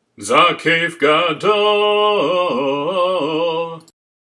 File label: Haftarah Zakef, trope; sinai